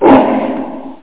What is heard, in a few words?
large dog bark